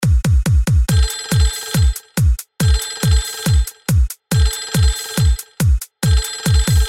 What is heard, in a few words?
Beat